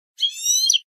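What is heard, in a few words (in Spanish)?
quejas paillos, canario; campero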